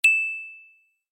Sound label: Blackberry ting